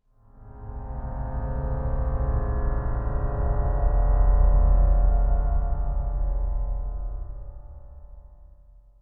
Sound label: amb organ; Blackfaun